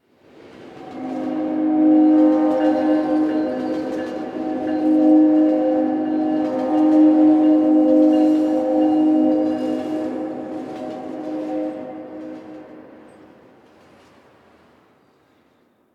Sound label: Distant Train G; Warframe; Ambience; NineteenNinetyNine